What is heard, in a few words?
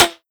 flavor metal, bullet impacts; physics; Half Life Model Pack